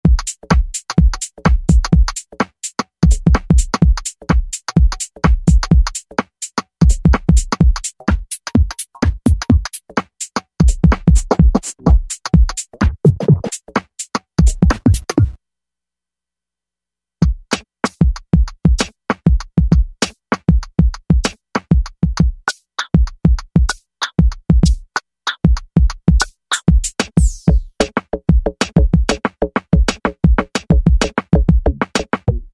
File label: Nu House KIT; Factory Patches